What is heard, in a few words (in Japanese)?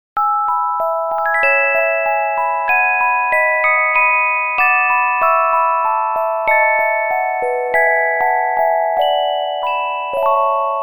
レトロケータイ着メロオルゴール